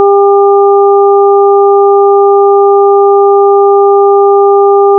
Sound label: wave 400Hz phase 0, 400Hz＋3倍音（2倍音と3倍音を合成）した音